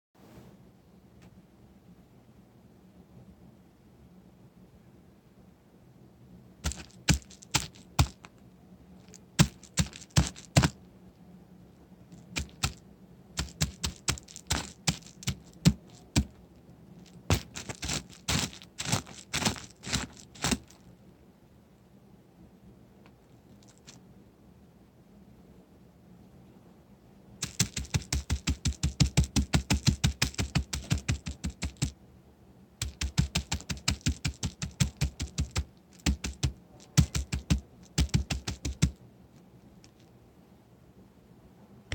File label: Dirt footsteps